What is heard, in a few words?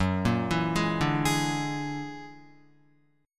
F sharp Augmented 9th F sharp Guitar; F#+9 arpeggiated; 1,1,4,1,2,4